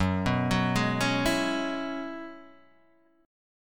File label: F sharp 7th Suspended 2nd Suspended 4th F sharp down Guitar Open D